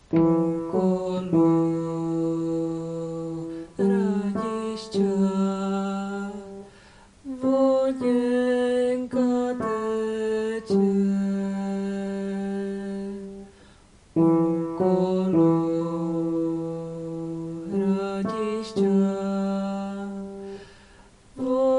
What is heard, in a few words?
okolo tenor